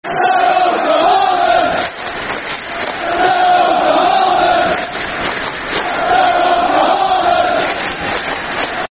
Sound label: Football Chant (Soccer Songs); Darryl Flahavan; Southend United Sufc